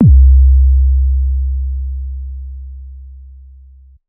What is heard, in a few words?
Long Clean Basedrum